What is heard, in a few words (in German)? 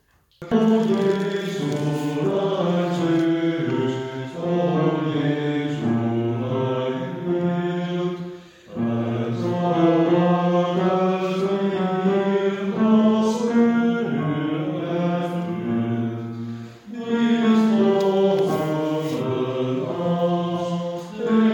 O Jesulein Suss Bas